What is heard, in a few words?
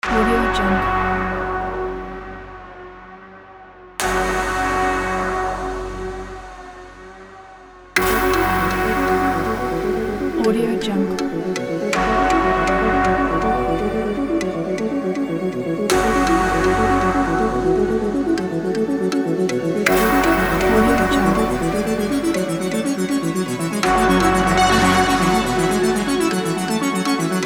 uplifted dream